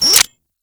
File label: Minigame Slide In; Warframe; Grineer